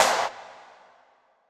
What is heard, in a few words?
Snares & Claps, One Shots; Touch Loops; CosmicFunk